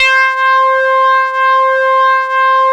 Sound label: 700 B-SAW C 3, Korg; Back In Time Records; Korg Universe VOL-1